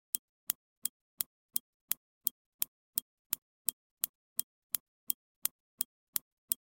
Time passing fast clock tick tock

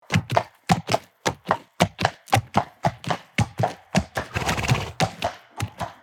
Galloping horse with snorts

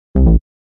Arcade 8 bit critical error

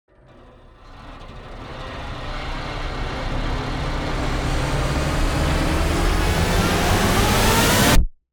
Metal wires intense riser

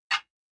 Grandfather clock single tick

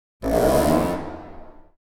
Cartoon gliss; cartoon comedy

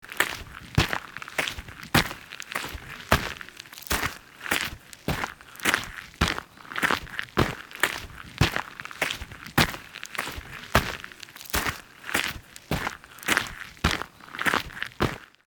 Footsteps walking on dirt sneakers

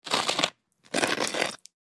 Toast butter spread butter knife

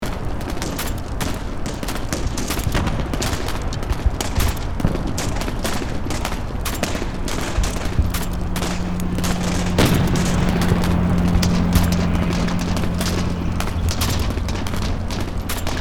Urban city battlefield ambience

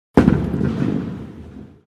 Bowling ball rolling down lane

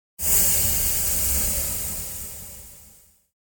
Steampunk machinery compressed air release hiss

